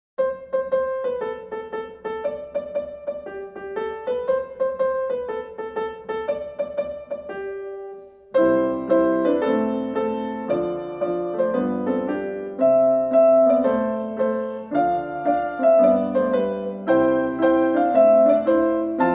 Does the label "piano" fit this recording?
Yes